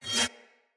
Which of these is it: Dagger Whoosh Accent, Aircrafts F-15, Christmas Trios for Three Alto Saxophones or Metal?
Dagger Whoosh Accent